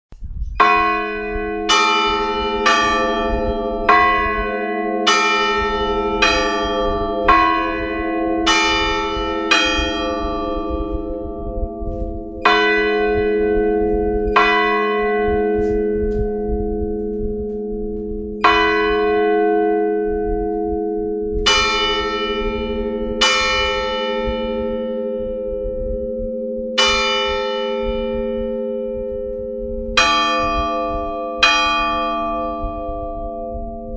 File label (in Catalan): toc difunts, campanar